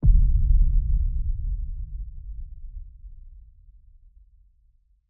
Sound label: Appears In Boat Boom; bramble the mountain king; ps4 ps5 switch windows xbox one xbox series xs; Pesta